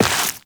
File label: Step Dirt; Footsteps; Walk; Warframe; Tenno